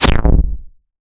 error, Retro Sounds 32-Bit

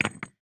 rock falling; breaking falling hit

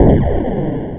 explosion big

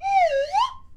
Fast Fall Rise, Slide Whistle

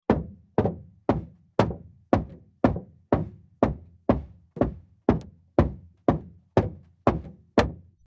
Metal Footsteps; horror; horror sfx vol 1

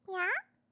meow, Catgirl; Catgirl Fighting SFX

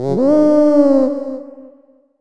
Retro Wolf; Animal Insects

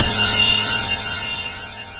Stab Surprise; FREE SFX PACK VOL 2

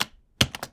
Keyboard - Key Combo

